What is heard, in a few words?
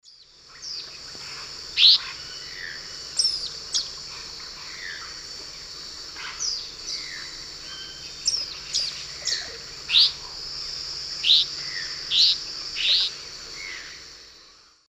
Tachycineta albiventer, calls; S. America; Eastern Lowlands